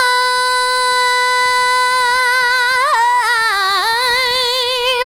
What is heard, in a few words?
UHA, VOICES